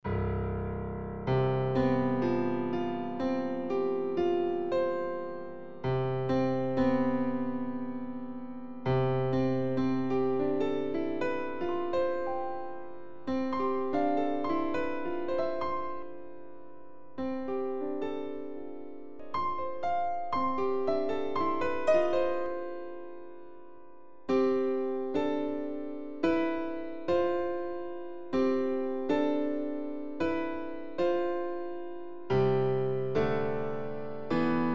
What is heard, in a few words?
Piano Noodle